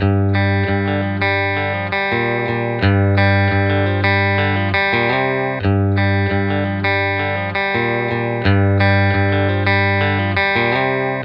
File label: low rhythm sample c